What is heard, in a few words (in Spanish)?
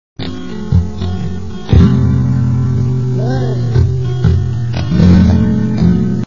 buleria SLOW